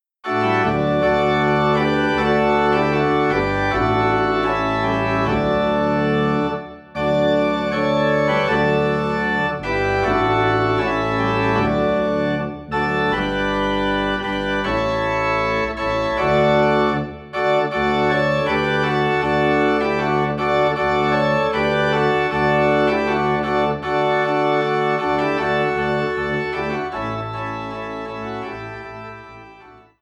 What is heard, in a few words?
Organ in D; Joy To the World; christmas